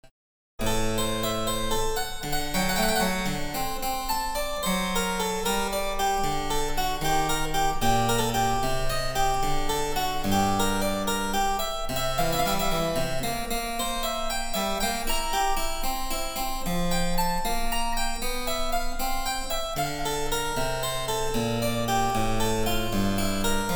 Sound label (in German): Cembalo; Mark Lindley WTC 1